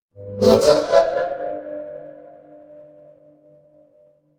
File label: fire electric arc